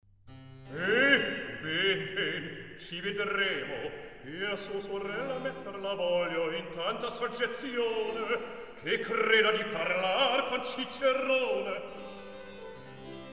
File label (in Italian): Recitativo; Eh ben ben ci vedremo e sua sorella; Mozart; La Finta Semplice